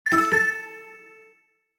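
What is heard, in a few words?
notification, sony ericsson phone sounds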